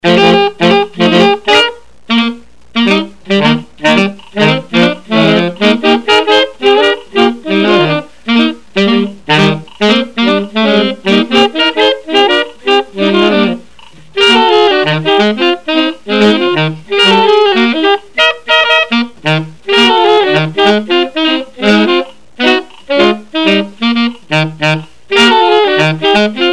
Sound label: Saxy Mario; Super Mario